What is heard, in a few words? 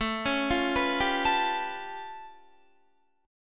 A Augmented 9th A, Guitar; 16,16,17,16,19,17; 4F,4A,5C,5G,5C,6E; arpeggiated